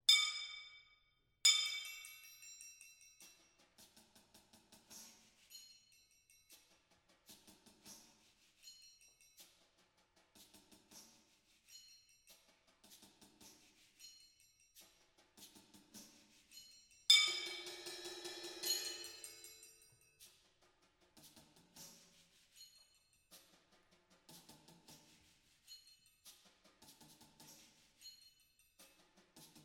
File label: Symphony In E Flat For Wind Orchestra, Clang